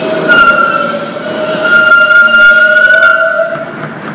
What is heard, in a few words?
rub, train; OpenBVE